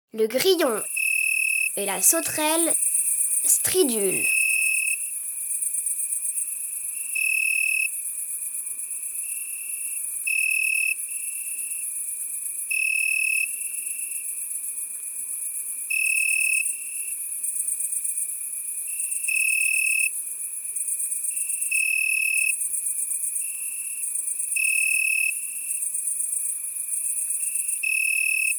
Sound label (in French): Le grillon et la sauterelle; mon imagier sonore de la nature